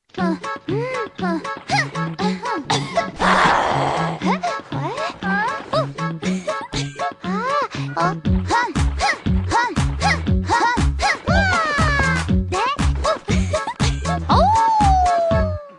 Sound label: Horo Polka Shortened